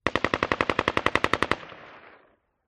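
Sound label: Ak47 Machine Gun Burst; audio hero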